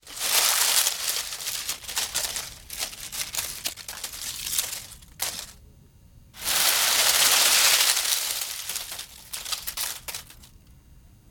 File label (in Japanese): 枯れ葉を手でかき分ける音